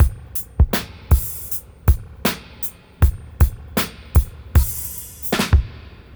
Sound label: 78 fill FX, drum loops, dub; Drumdrops In Dub VOL-1